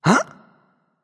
surprised; smite; arthur

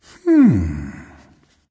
thinking, voice; Smite; ymir skin12

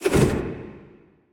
basic spin; Smite; Cabrakan

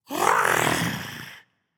growl; smite; xingtian

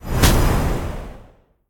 lobby wind hit; smite; tiamat

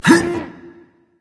grunt lift berserker; cuchulainn

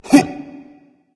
grunt jump berserk; cuchulainn

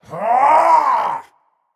battlecry; smite; zeus

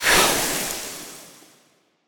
intro jets; smite; khepri

